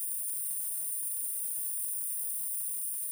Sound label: Gas Leak; Handheld Acoustic Imager